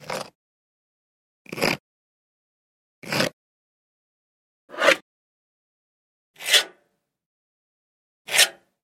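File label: sounds of scratches on metal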